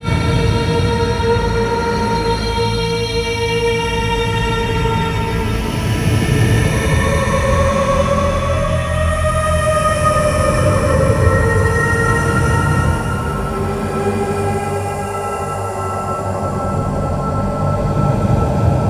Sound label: foreboding, ambience; VOREStation